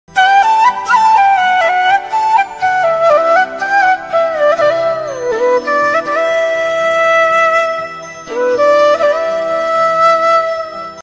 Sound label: malayalam ringtones, love song bgm tune, mobile ringtone